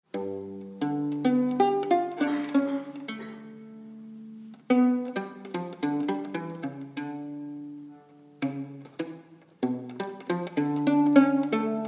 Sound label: pizz